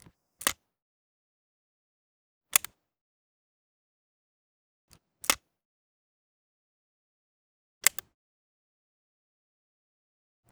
Smith & Wesson M29 .44 Magnum foley close up cylinder open & close; MKH416; Various Gun Foley & Handling